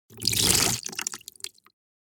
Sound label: Wet sponge squeeze dripping water; cleaning